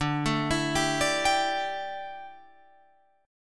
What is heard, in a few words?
D Suspended 2nd Suspended 4th D down Guitar Open F; arpeggiated